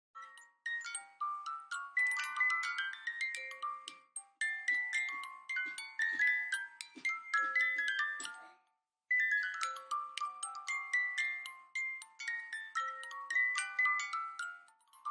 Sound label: muji musicboxes